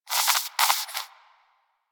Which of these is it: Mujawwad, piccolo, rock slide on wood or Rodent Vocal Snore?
Rodent Vocal Snore